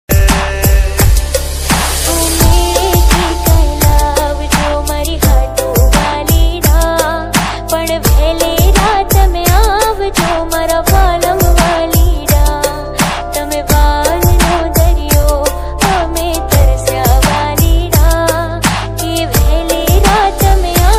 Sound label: DJ ringtone